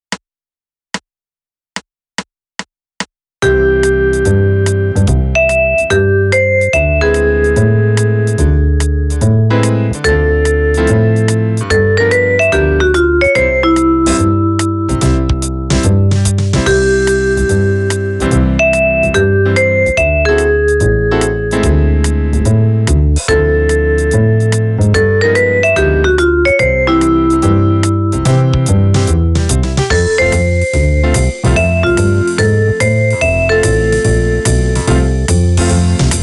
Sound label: melody at 145 bpm; Jazz Repertoire